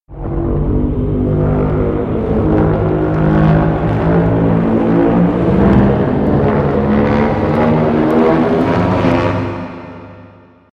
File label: Beam Charge; Godzilla